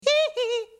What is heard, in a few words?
hee hee